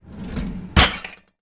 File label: household cutlery drawer open